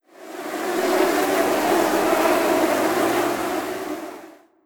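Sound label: Dis Mosquito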